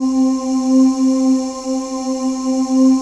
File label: PAD, ATMO PADS; Best Service; XX Large